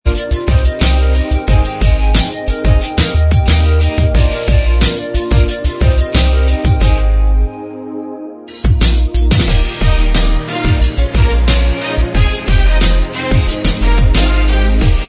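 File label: instrumental track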